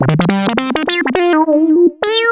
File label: lead (brass)